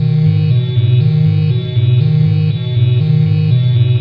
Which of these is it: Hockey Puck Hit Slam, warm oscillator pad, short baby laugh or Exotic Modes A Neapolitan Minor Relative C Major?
warm oscillator pad